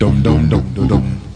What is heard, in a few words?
dumdum dumdeedum, male; MISC and SPOKEN WORD; VOCALS and SPOKEN WORD